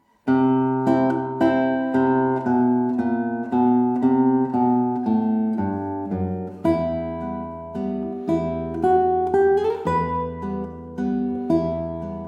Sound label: Waltz; Calatayud